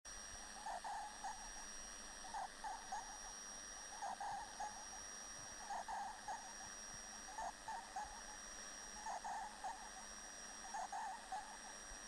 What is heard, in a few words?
strange mediumwave signal